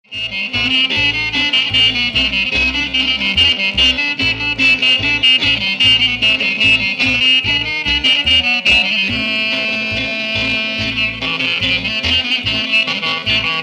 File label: Syrtos; Ena Mikro Vlahopoulo